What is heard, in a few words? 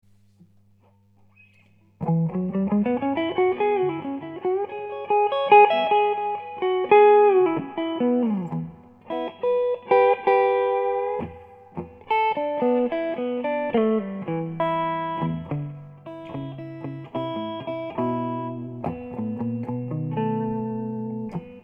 blues